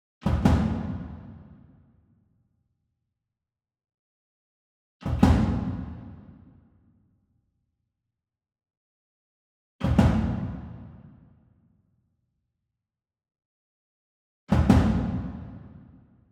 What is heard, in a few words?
SMALL DRUM Kick Drum Double Hit Far; boom library; Cinematic Strikes Construction Kit